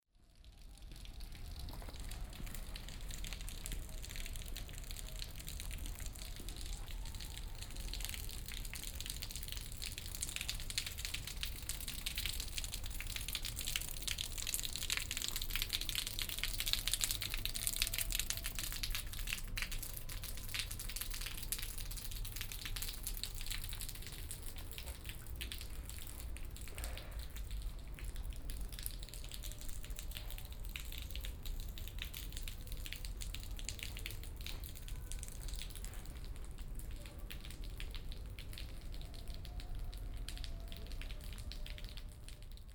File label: paris water